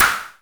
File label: noise fx; Masterbits; Switch FX